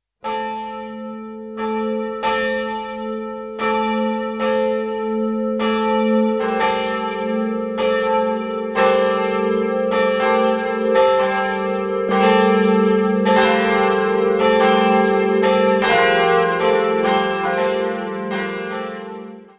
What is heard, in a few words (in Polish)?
3 dzwony